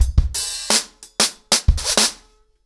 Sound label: 90 BPM, acoustic drums, drumloops